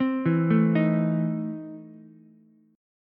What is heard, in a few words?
E Major 7th Suspended 4th Sharp 5th Ukulele; arpeggiated; JGuitar